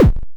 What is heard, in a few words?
hit; uberpong